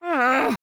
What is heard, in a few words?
Woman Frustrated; Zomboid; Lifestyle